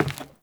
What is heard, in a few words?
Grunt Step Wood, footsteps; Enemy; Characters; Corrupted Sproutfolk